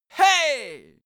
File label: male cheer victory "hey"; schreie; menschen